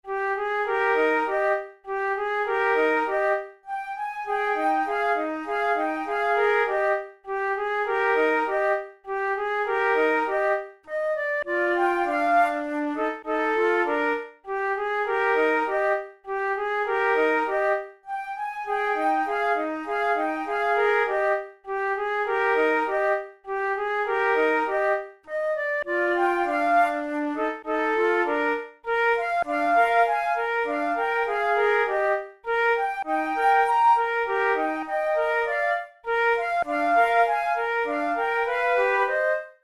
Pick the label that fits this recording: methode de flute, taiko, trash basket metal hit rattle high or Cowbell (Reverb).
methode de flute